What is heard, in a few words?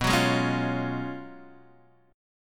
F sharp Major 7th Suspended 2nd Suspended 4th, B